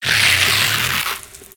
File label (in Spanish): Grito de Deino